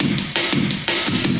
170 gated classic, drum loops and breaks; 161 to 180 bpm

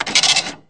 mechano wind; Weird and Interesting Hits

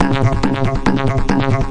140 bpm hexbeat

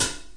Hat A3 Foot pp; REAL LIVE KITS

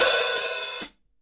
lingering ohh to chh, hats; DRUMS (SINGLE HITS)

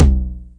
909 Lo Tom, drum machines; 909 Extended

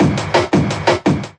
171 tekstep puncher, drum loops and breaks; 161 to 180 bpm

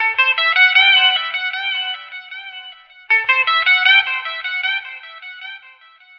077 echo bright guitar melody; GUITAR LOOPS

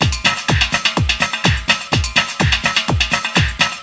perky compressed, drum loops and breaks

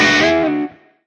guitar one wah woot; Melodic Stabs and Hits

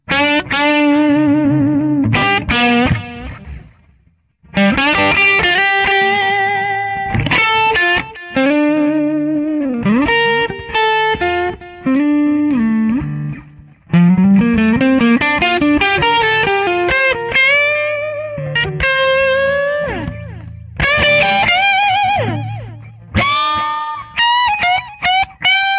super blues guitar

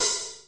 CYM, Alesis HR16

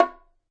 8 bongos bongo; ETHNIC and WORLD PERCUSSION; Eight Bongos

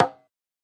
conga Slap; ETHNIC and WORLD PERCUSSION

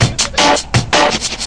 163 hard knocks, drum loop; 161 to 180 bpm

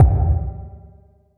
verby tone F sharp 2, Verby Tonal Kit; UNUSUAL KITS and FX

